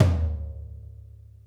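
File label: floor, Drum Kits; Sonic Reality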